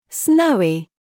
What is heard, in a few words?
snowy